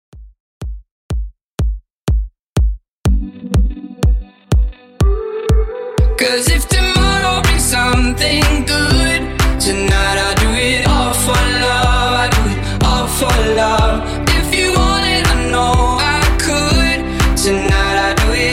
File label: In Outro Dance Club 123Bpm